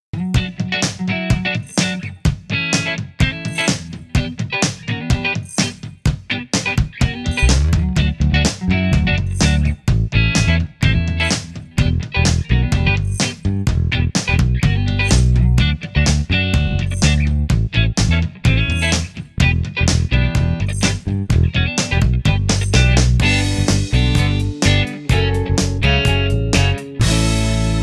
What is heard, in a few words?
ITALIAN GIRLS Instrumental